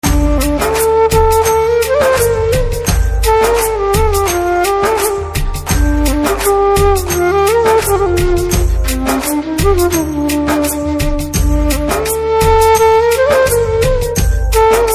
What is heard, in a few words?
Flue BGM